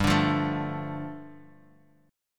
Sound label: G 7th Suspended 2nd G, Guitar Drop D; down